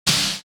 lightsword lightsword clash; soundscrate

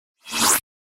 Anime Laser Charging

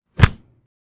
brutal hit

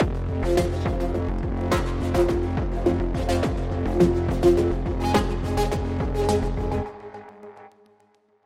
pulsing logo; soundscrate

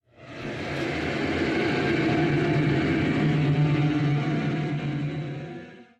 Screamy Screetchy; soundscrate

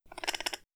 exotic bird sounds; soundscrate

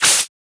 snake attack

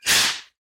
snake attack

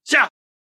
man attack light; soundscrate

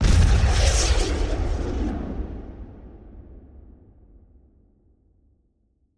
anime explosion vacuum

